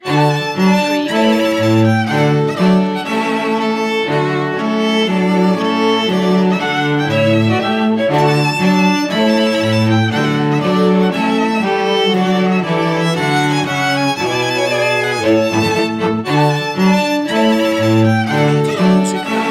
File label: trumpet tune for string quartet; purcell